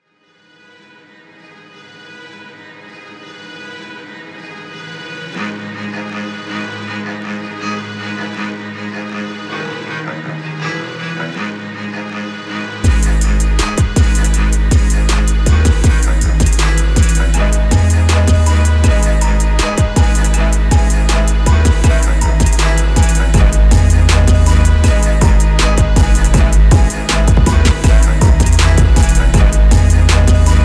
Street

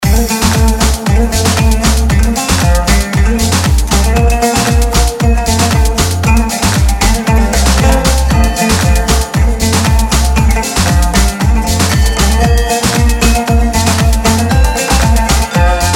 Arabic House

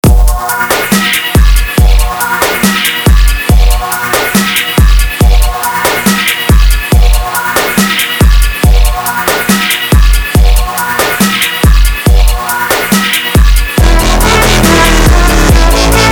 Dub Step

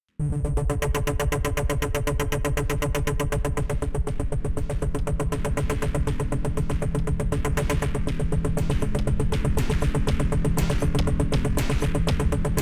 Hard bombola

